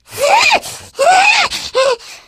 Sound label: spitter lurk, voice idle; source engine; left 4 dead 2